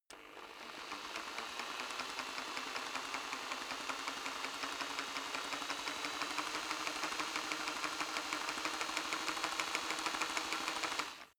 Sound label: rock drill hammer speed up very soft; maschinen; bohrer; soundpool